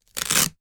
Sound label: velcro, Velcro Rip; Velcro Rip / Pull; Ripping; Objects; McKinneySound